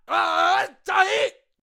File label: Attack Vocals; Shadow Walker